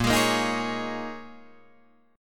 Bb Minor 11th Bb down Guitar DADGAD

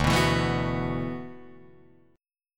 D 6th Add 9th D, Guitar, Drop D

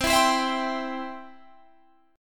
C Major

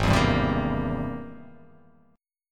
Ab Augmented Major 9th Ab; strummed; 4,7,5,5,7,5,x,x; Ab+M9; JGuitar

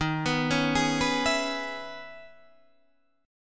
Db Minor 7th Eb

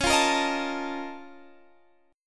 Bb Minor Major 11th Db

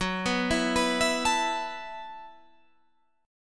E Suspended 2nd Suspended 4th F sharp

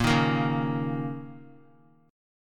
Bb Minor Double Flat 5th, Guitar; strummed; chordshape; x,1,3,3,1,x; Bbmbb5; JGuitar

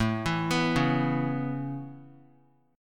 A Major Add 9th A, chord; Aadd9 arpeggiated; JGuitar

